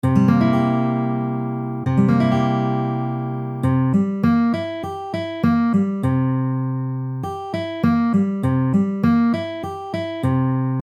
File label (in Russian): Septakkord C7